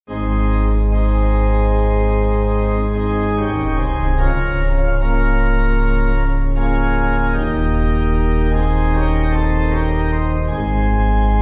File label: Pipe; Knapp